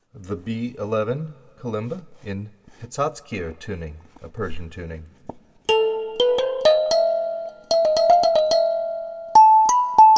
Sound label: kalimba